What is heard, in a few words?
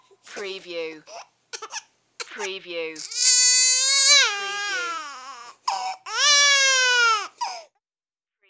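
Baby 1 Month Cries; Baby sound effects for use in multimedia projects.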